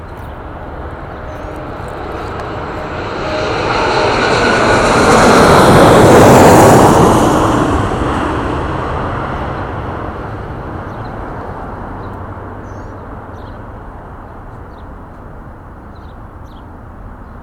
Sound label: LGA LOUD, lga flight path over queens